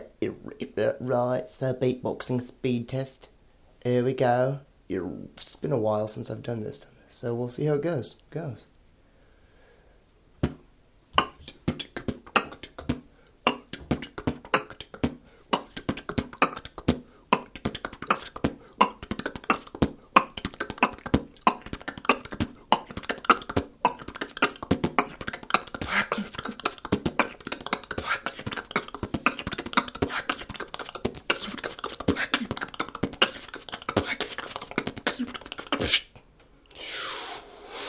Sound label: beatboxing; speed test